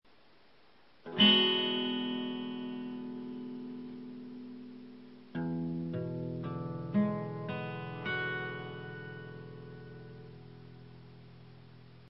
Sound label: Accord E9